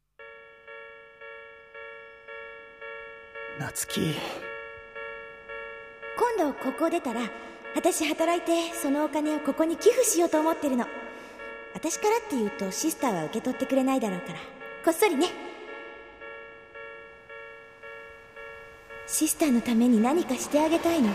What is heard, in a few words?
howl